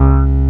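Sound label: SUSTAIN BASS C3, SYNTHS; OBMX-SYNTHS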